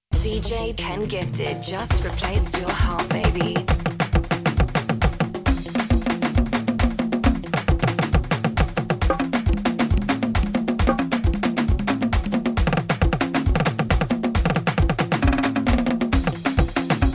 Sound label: Drum Riddim